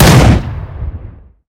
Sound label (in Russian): chetkij korotkij vistrel iz avtomata v tonnele